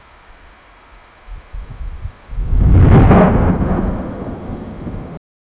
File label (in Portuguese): furacão se aproximando, hurricane